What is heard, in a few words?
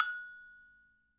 HI AGOGO MF; Sonic Reality; Interactive Drum Kits